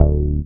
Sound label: 106 Bass D, One Shot Bass